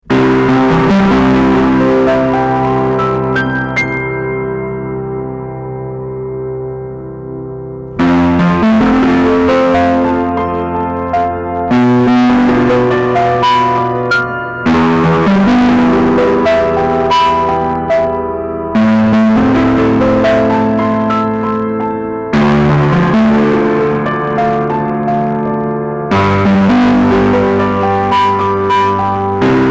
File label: 32 Bit Float Piano Maximum Preamp Gain